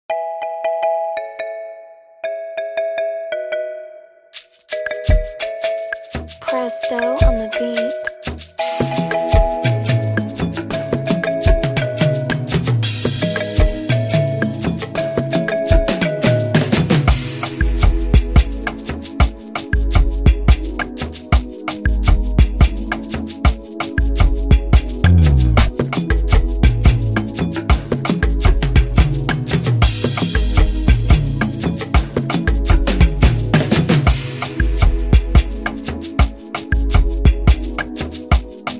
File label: Afrobeat Instrumental